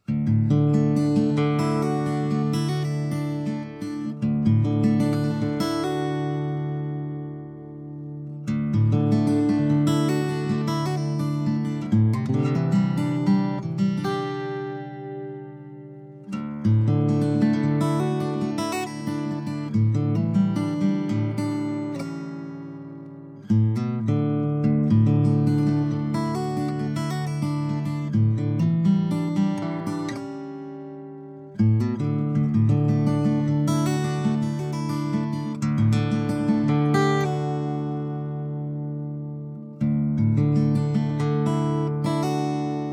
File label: Guitar